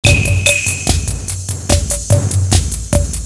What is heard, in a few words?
808, drumloops; esoteric; journey down the river nile